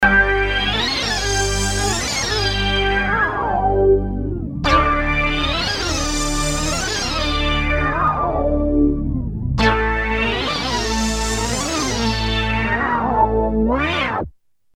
weird, redsound darkstar xp2

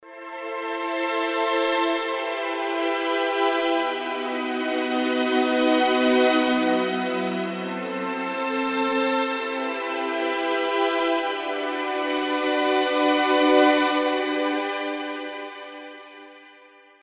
pad drama, roland jv880

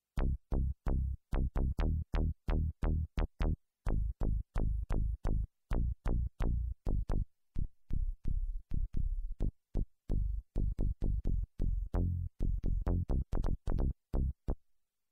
bass, yamaha sy99 synth; Synth: arpbass

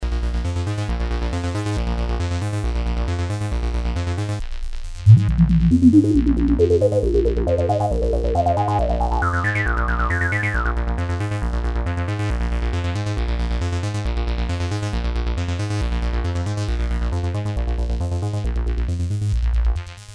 seq, redsound darkstar

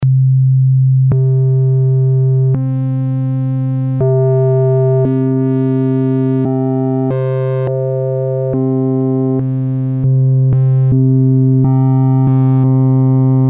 wave, Sinewave + 15 harmonic variations; yamaha sy99 synth

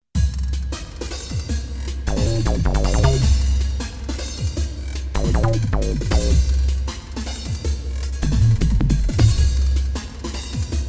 seq, korg ms20 synth; sequence: MS20 + drum samples 6

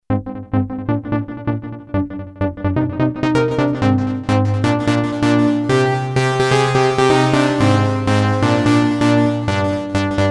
korg m1r synth

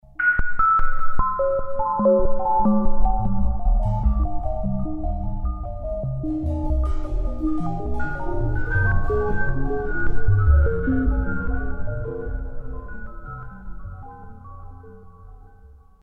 FX: sample hold, Roland JV2080

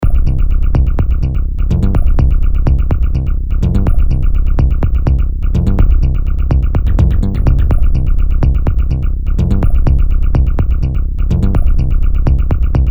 Intro bass by Kawai K3

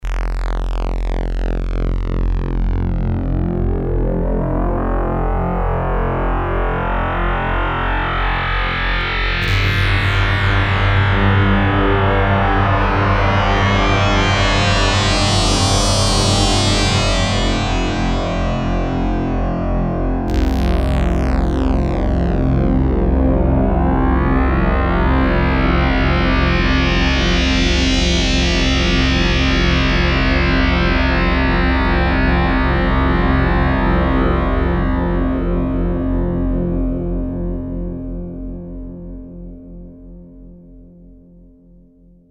synth raw fm, yamaha sy99

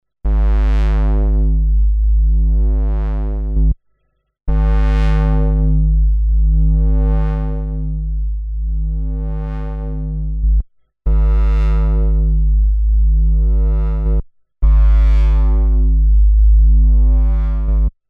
Korg DSS-1

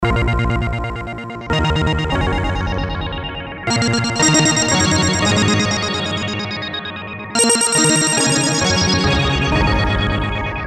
synth filter

